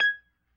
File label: G#4 PRC, B3 PERC; Big Fish Audio - First Call Vintage Keyboards